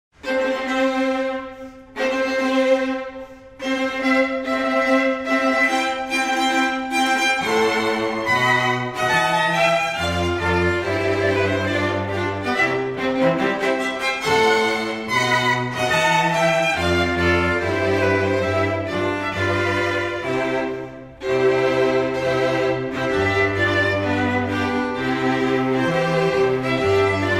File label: Wedding March, quartet